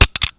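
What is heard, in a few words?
stone; Mafie 1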